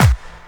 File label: KIT HOU; Best Service; Dance Mega Drums